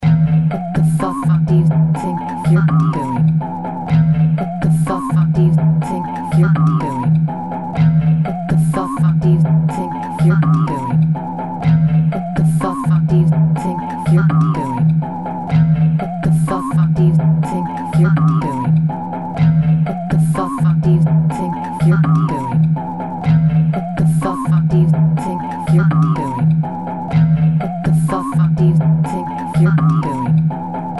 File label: creepy cha cha